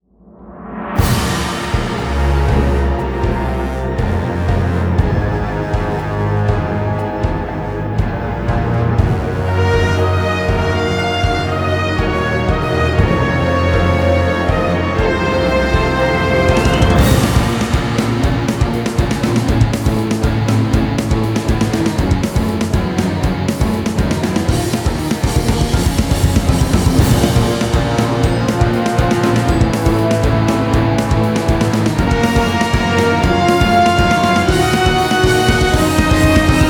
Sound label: theme battle; Cris Tales; PS4 PS5 Stadia Switch Windows Xbox One Xbox Series X/S; enzobattle